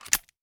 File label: m67 pin pull; explosive; garrysmod